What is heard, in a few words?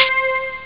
crazy voice; Amiga Soundtracker Sample Packs